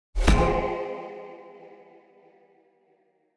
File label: Game Over Sound